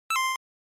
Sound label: NES Action Item